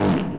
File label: fart; Pong